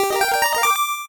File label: 8bit se